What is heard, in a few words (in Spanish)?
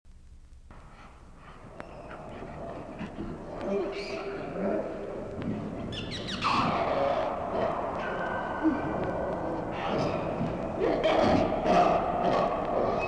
fieras